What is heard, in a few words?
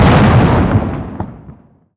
EXPLOSION Distorted Long stereo